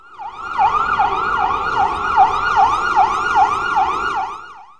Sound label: ambulance fade in out; Sound FX Real Life